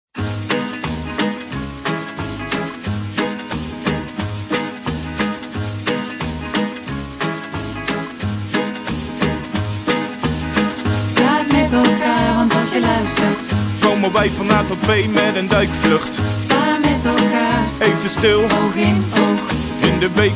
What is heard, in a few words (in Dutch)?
dialoog rap